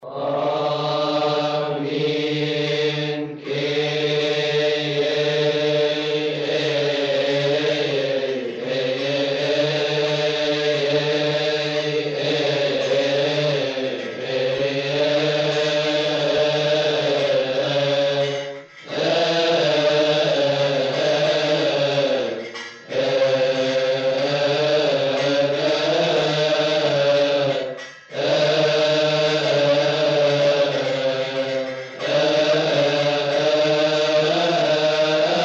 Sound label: Kyrie Eleison Procession; Holy Week